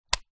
household mains power plug pull out remove from extension block